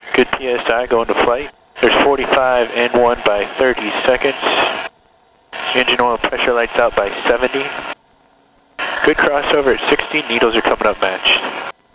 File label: Heli Pilot Eng Start; Transport-AirMarine; PFx Brick